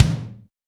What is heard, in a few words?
BONUS BD, Drums